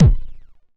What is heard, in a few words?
mid range steel kick drum sample d sharp key